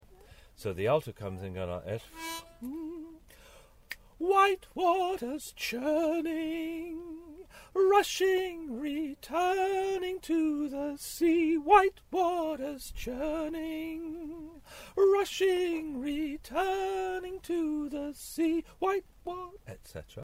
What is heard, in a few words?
Alto; Parts for Start section [A]